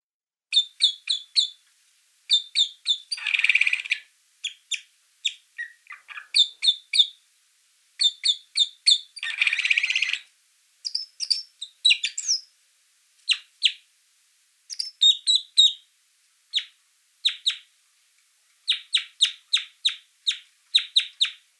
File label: Loxia curvirostra